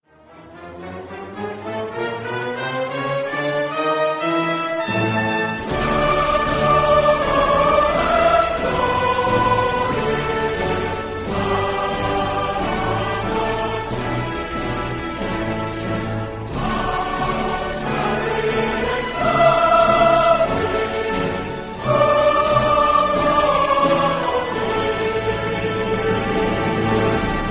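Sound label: land of hope and glory, ringtone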